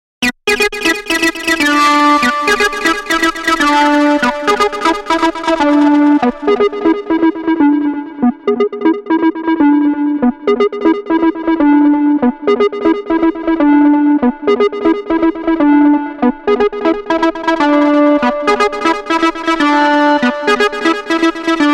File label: 303 acid